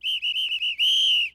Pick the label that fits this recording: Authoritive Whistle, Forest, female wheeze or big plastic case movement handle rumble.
Authoritive Whistle